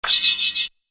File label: short swirling scrape; sound ideas